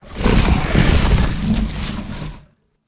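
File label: Hydra voice; Legend of Keepers (gamerip) (2019)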